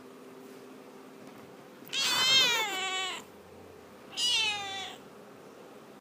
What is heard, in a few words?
Cat Meowing